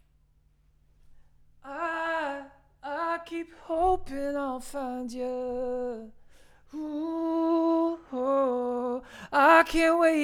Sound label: Vocal